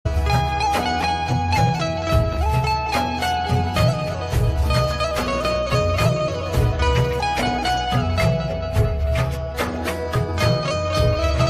instrumental ringtone

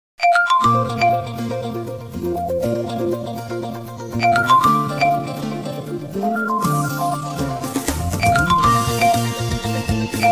Vivo Default

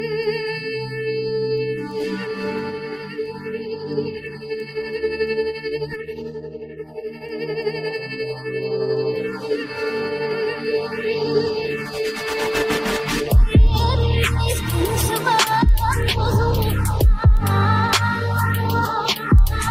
Trap; Vivo

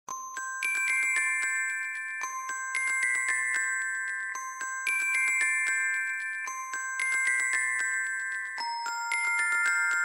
Redmi Note 10 Pro China Official, ringtone

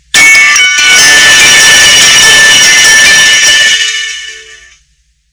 Ringtone

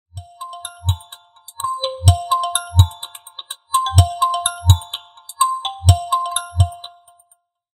Vivo V23 5G Official, ringtone

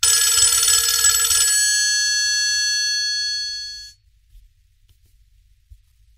Nokia Alarm; 1 Plus

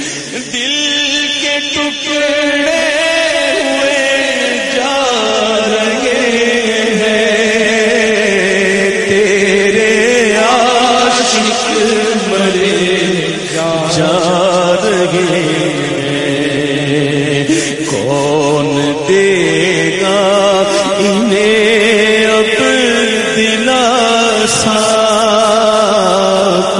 Alvida Mahe Ramzan Ringtone

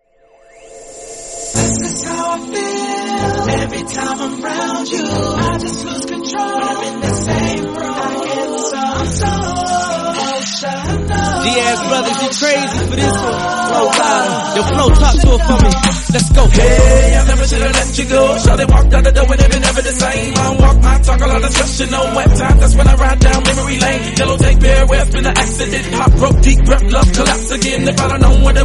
Emotional

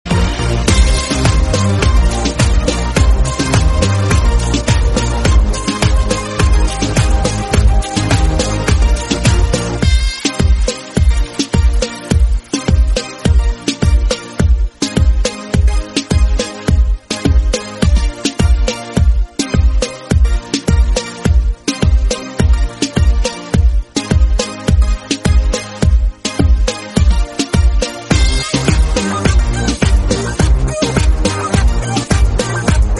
Instrumental

